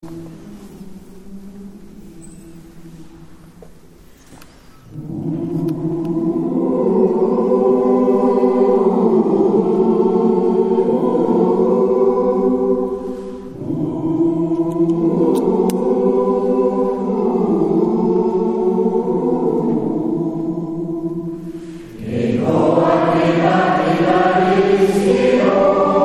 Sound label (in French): hegoak chant basque